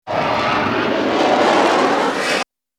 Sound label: Bullet Time; FXHome; Bullet and Gun